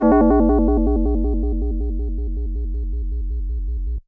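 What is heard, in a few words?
FM Bell FX DX100 G#1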